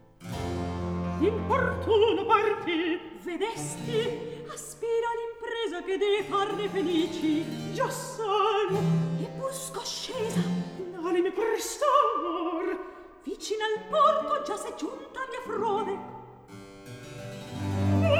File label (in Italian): Recitativo; Scena 8; Orlando, Angelica; Antonio Vivaldi (1678 - 1741); Vivaldi - Orlando furioso (2004); Atto II